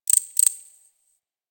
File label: Forest Close Insect Chirp; Warframe; Grineer Forest